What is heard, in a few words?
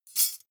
midsize metal thin clank drop